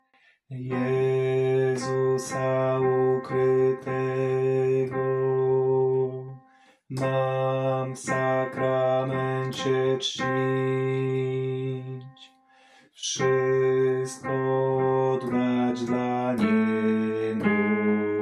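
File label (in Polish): bass, nagrania głosów; warsztaty2026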